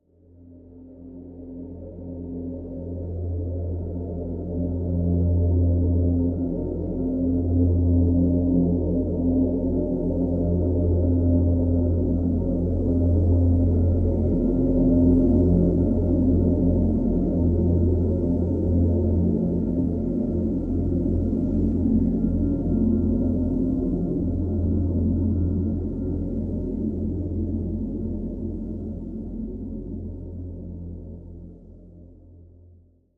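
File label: Drn F, drone; Drn River Crossing F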